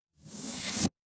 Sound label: transition riser